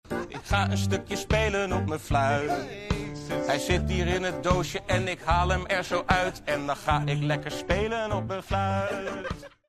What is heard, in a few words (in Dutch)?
fluit extended